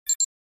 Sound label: Flash Beep HF Dbl Beep